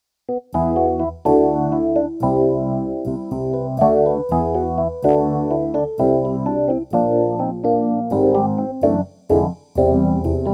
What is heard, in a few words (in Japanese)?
エレクトリック; 即興演奏